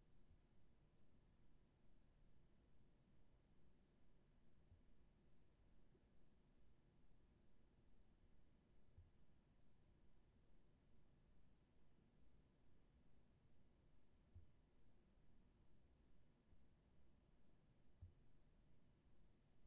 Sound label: Room tone